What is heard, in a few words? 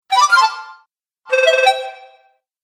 Game Award, video game sound effects